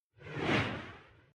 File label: Whoosh Soft Sweep By Slow Squash Racket Light Flange Left To Right